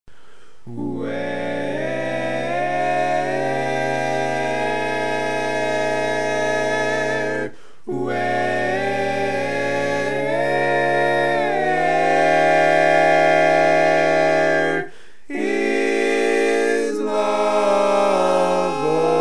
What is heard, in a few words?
Lead